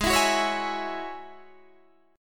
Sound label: A flat 7th Suspended 4th Sharp 5th A flat Guitar Standard, chord; Ab7sus4#5 strummed; 16,19,16,18,17,16